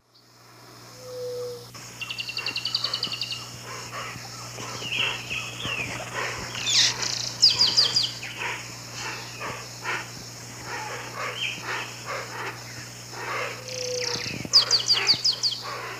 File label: Agelasticus xanthophthalmus, calls and song; S. America; Eastern Lowlands